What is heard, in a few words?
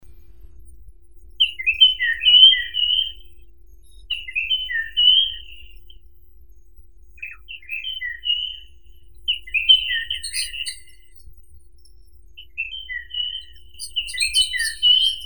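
large black-headed grosbeak, Eophona personata; dubonos bolshoi chernogolovii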